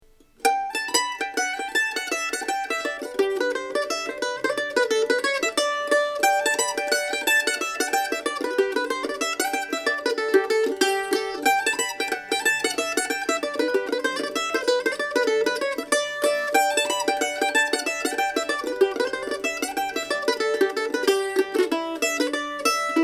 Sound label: Mandolin FF